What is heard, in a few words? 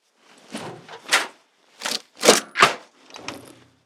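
weapon minigun jam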